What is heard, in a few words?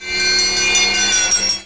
FIRETRUK